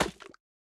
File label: Slime Impact Large; Going Under